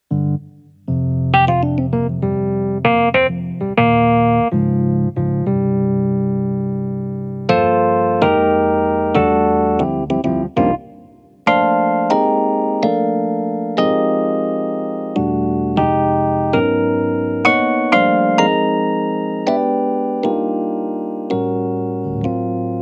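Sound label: dyno electric piano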